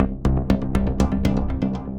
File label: Tense Pulses; Faraday